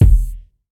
short reverb steel kick drum sound D# key, Royality free kick single shot